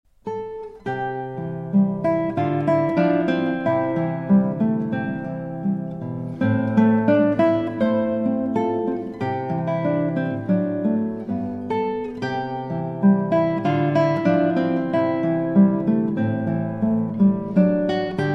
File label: guitar; usa